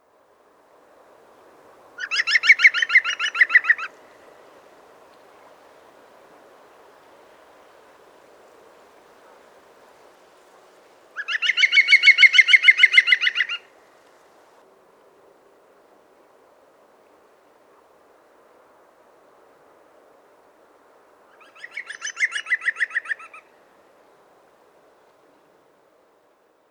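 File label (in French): pic vert, green woodpecker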